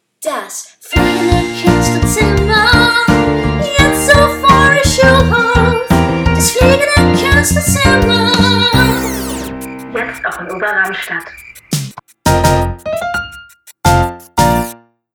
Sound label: jingle